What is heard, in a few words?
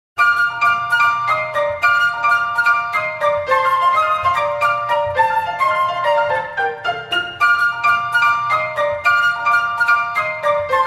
Flight of Fancy for flute and piano